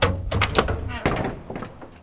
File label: door op; Mafie 1